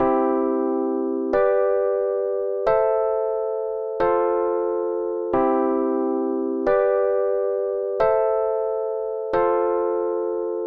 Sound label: Chord Basic 1 6 4 5